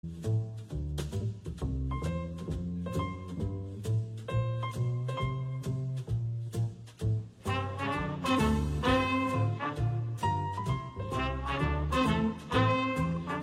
Jazz

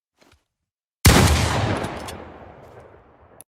Pump Shotgun Sound Effect; Fortnite; Legendary Pump

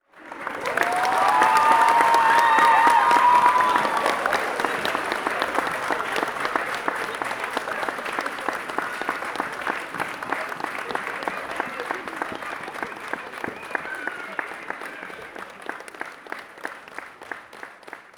ending show audience clapping

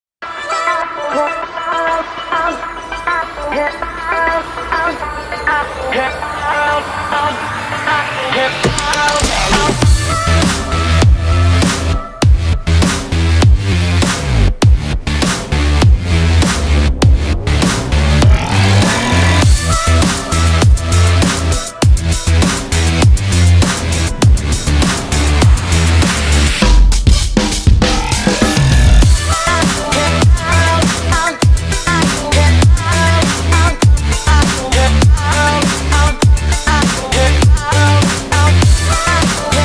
Cyberpunker Action Background Music; Cyberpunk 2077 Gaming Videos

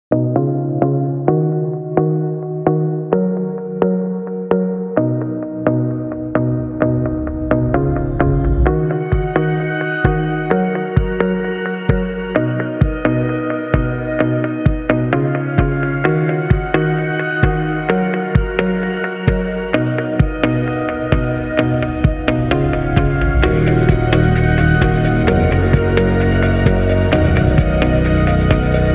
muzic

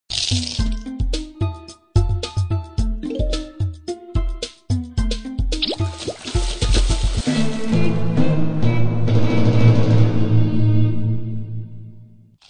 Game Over; Plants vs Zombies

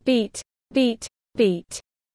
Beat

